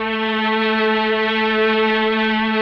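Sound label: MELLOSTR, Mellotron Violins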